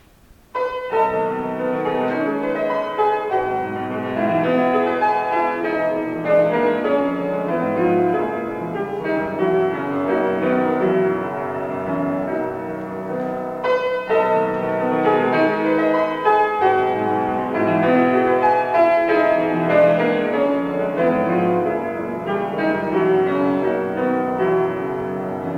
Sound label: Solo Recital Intermezzo in A Minor